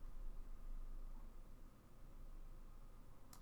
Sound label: silence